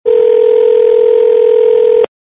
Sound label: ring telephone internal